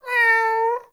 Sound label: Kitten Meow Playful; Enemies; Warframe; Catbrow